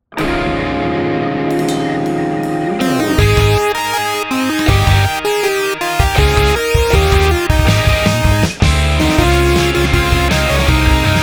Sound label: Org Key Synth Melo long